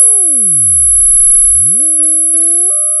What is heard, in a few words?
pop, sound of a bike bell